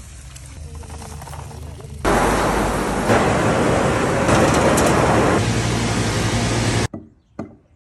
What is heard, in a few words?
olive mill sound; olive mill sculpture